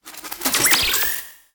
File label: mechanism move bot small; soundmorph